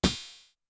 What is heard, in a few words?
Cym; Sonic-Potions LXR; Dirt